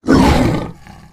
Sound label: boar attack; STALKER; customnpcs